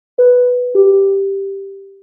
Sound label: notification